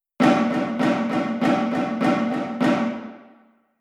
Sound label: snare off